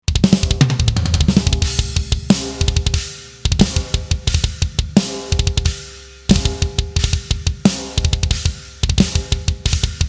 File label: steel snare low; reference steel snare low